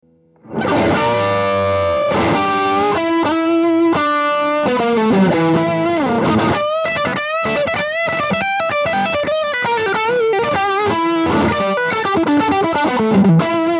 Rocker Terror Dirty Lead; Orange

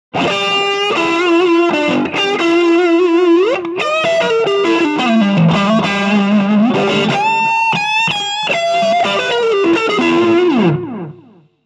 Crush Cranked Lead Delay In FX Loop

